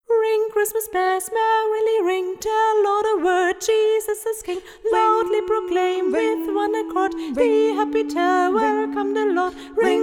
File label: Ring Christmas Bell